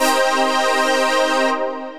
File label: Minor 7 Lush, Synth Lead